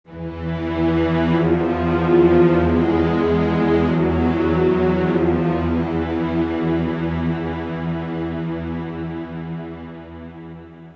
Gameplay Track Oneshot Strings; zombie army 4 dead war ps4 stadia switch windows xbox one gamerip 2020

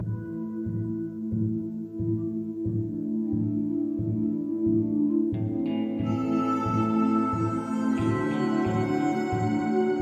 Western Daytime Ambient Loop

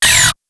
guns gun player zoom

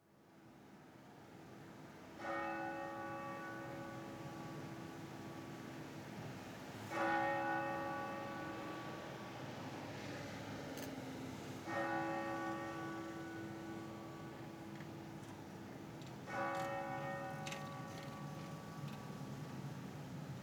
Blues; Fighters Impact

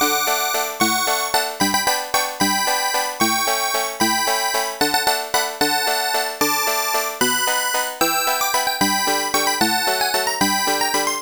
Bonus Round Music; Toy Pop; Sharp X1 Turbo

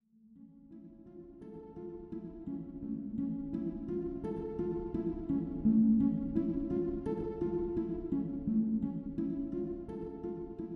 85bpm; Legends of Pegasus; windows gamerip 2012

